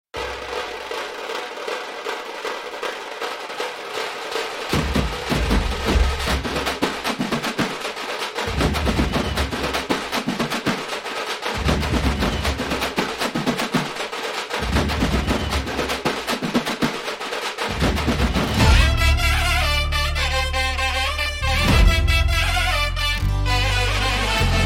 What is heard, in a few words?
Drum Beats BGM